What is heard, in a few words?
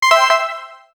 when in games a point; gameplay